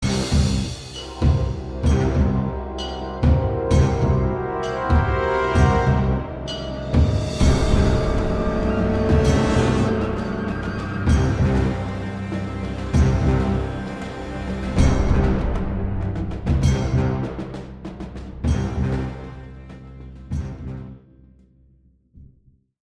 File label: music boss prefight; Kingdom Rush Frontiers